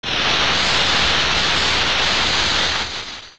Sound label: Sparkle; Ganondorf